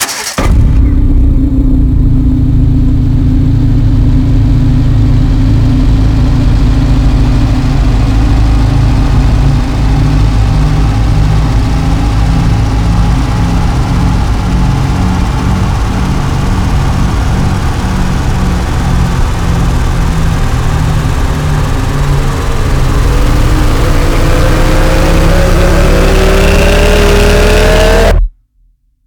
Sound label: riser; devil hot rod